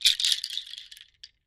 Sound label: Shaker Bracelet Natu, percussion shaker